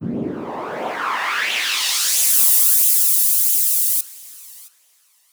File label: Rise; сэмпл звукового эффекта хип хоп